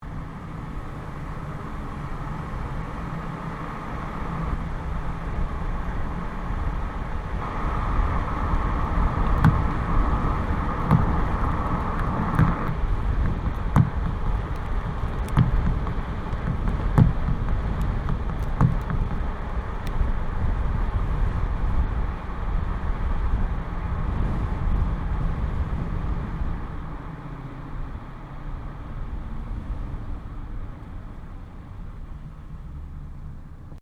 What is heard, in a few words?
Car tyre noise accel decel catseyes; Cars (Sound Effects:Vehicles)